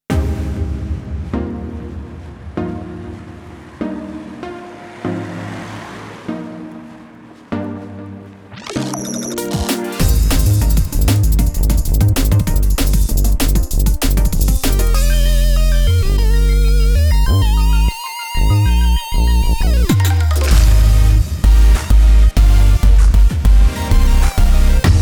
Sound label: Inst